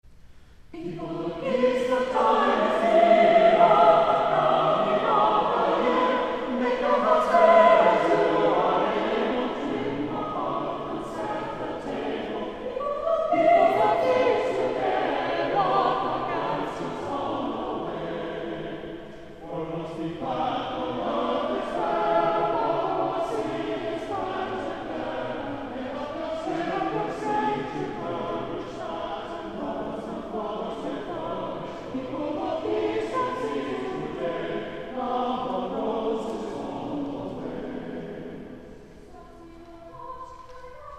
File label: Carol, People look East; Barry Ferguson; St. John's Cathedral, Jacksonville Florida